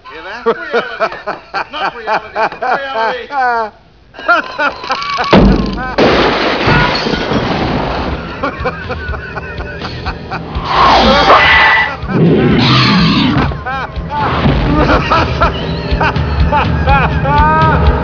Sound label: laughter